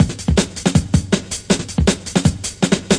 160 roll to the moon and back, drum loops and breaks; 141 to 160 bpm